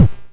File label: Electron Sidstation, Drum Machines